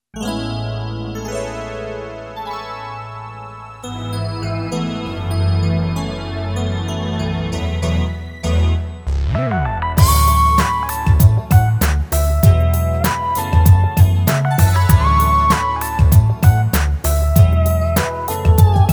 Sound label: E.Piano, Yamaha PSR-550 Factory demos